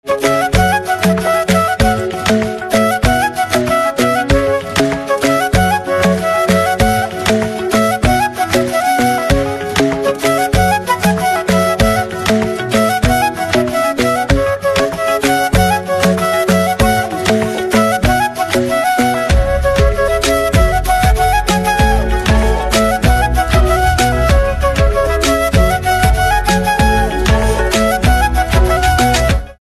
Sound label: Flute Instrumental Ringtone